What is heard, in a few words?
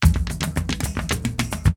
drums